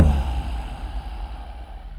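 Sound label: Music Shield; from dust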